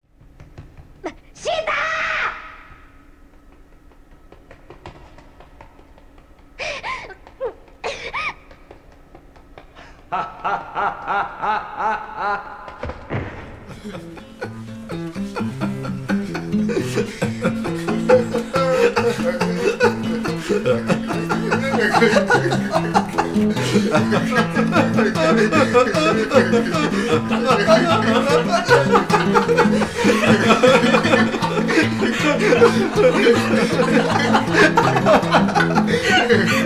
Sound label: hahaha; Ха-ха-ха!